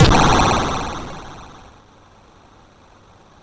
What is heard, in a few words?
Taipan sfx, MOS6581R4